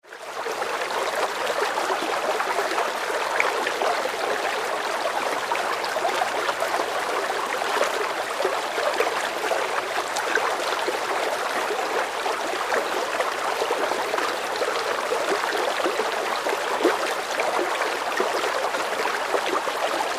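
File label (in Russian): zvuk ruchya, stream sound; журчания ручья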